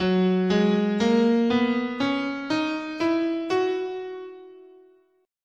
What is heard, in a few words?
Mixolydian, scale, guitar